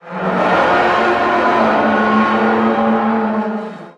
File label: damage vehicle; World Of Warships